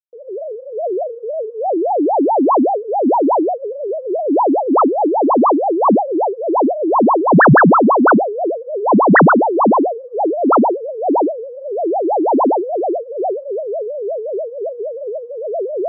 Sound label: seismo example; deltaf500hz; augmented audification; sonification